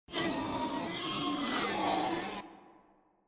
Krik vampira

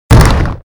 Dverju hlopnuli

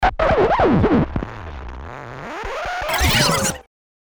Vinil zaelo

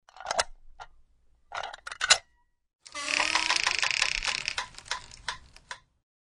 Otvoryajut dver

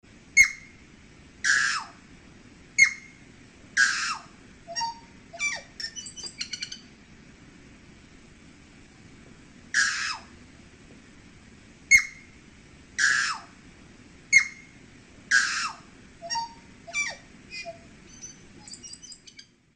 Novozelandskii Tui Poje

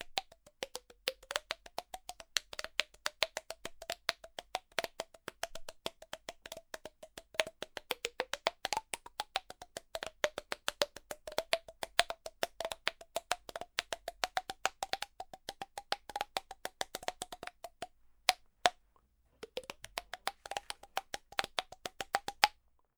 Po schekam stuchat melodichno

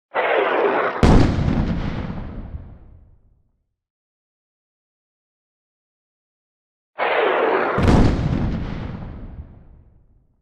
Artilleriiskii snaryad

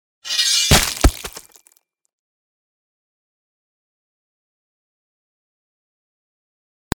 Gilotina kaznili otrubili golovu

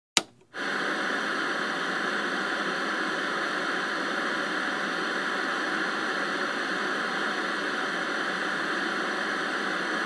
Vkljuchajut televizor shipit

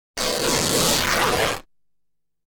Tkan rasporoli

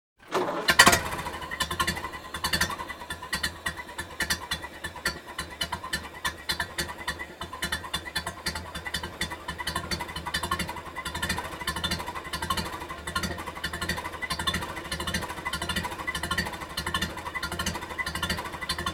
Tarahtit staryi avtomobil

